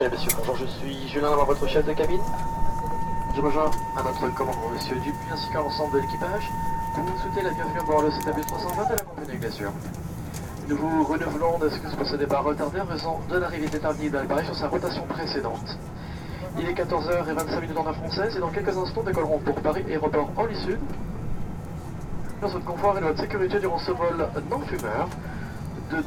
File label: Plane Before Take Off, Airport & Plane Ambience